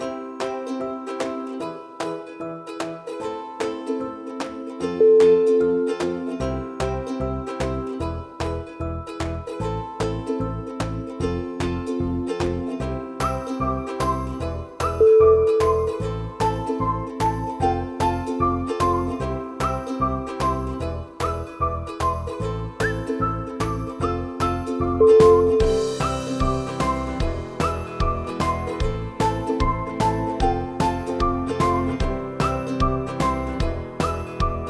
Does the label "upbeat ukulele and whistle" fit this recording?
Yes